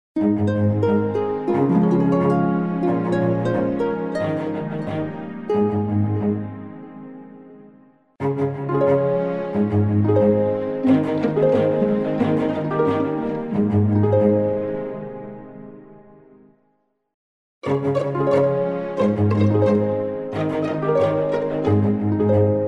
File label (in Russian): rojdestvenmuz